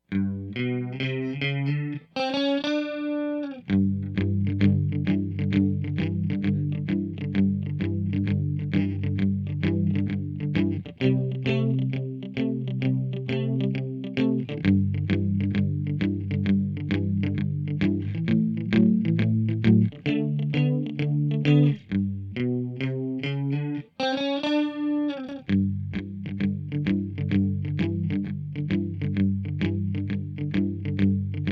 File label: Carolina Blues rhythm guitar track